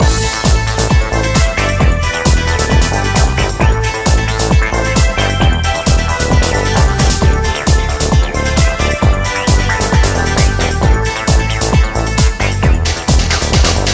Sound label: ringtone dance, instrumental